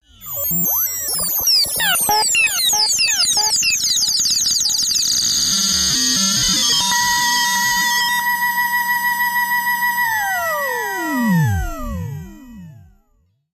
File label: Warp Field Signals